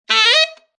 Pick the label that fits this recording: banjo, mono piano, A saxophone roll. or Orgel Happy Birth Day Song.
A saxophone roll.